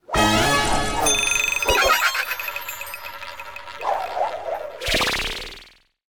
general bonus

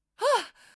Voices Falling; Adam's Venture Origins

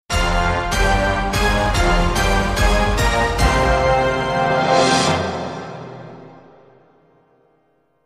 Into Commercial Break; Who Wants to Be a Millionaire

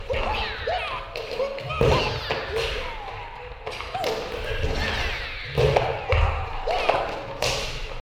Dojo Ambience; Slime-san Blackbird's Kraken

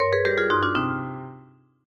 Round Lose

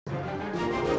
Music Pirates Main 128bpm

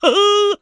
clown taunt; Ringling Bros. and Barnum & Bailey; Wii

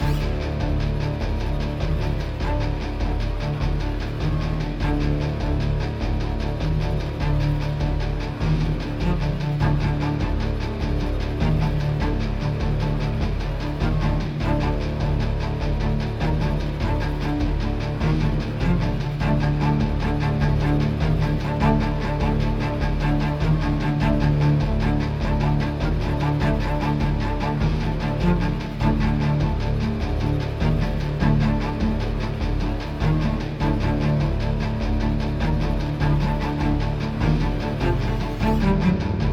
Action Run 100bpm Mid; project zomboid

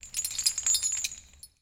Chain Move Fast

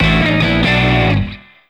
Jingle; Elevator Action Deluxe; PS3

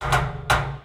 Creak Metal

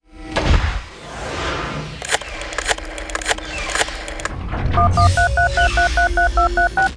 prize idle megaphone; Ringling Bros. and Barnum & Bailey; Wii

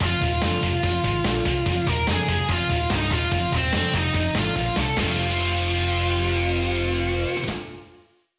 Jingle Gamestart

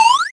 Good Power Up Sound Effect

